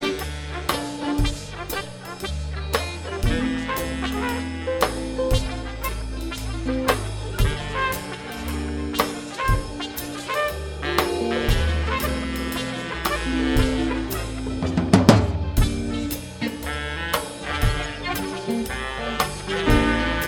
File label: amorphous attack Processing